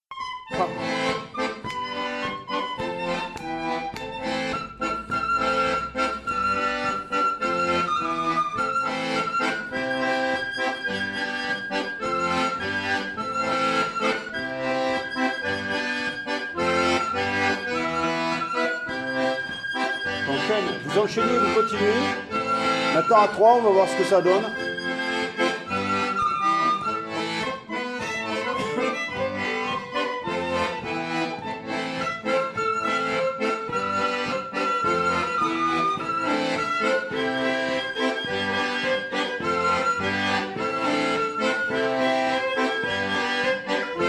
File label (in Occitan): Hilhota de delà l'aiga (2e voix)